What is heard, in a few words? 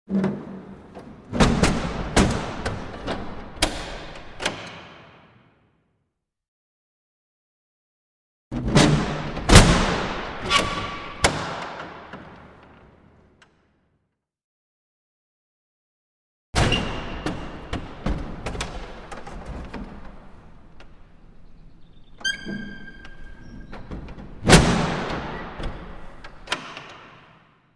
Window With Bar-lock Mechani, Castles Palaces & Monasteries; Hollywood Edge Historical Series; cd4 Castles Palaces & Monasteries